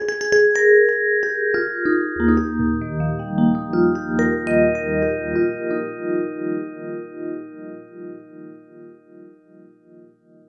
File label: test vib